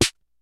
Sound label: KICK SNARE; Kid Nepro; Elite; KICKS SNARES